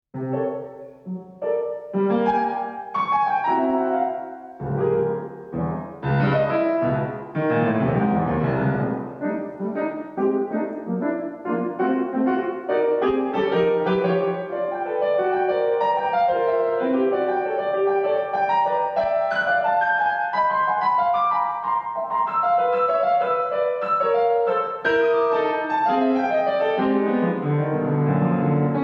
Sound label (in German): Klavierspiel